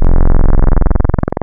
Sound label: Analog 80's PWM; Keys; Synthetic's Serum Collection; ESW X Keys